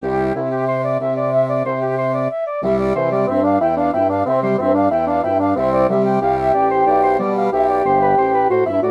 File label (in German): versus 4 laa mich kein lust noch furcht von dir for flute bassoons; Johann Sebastian Bach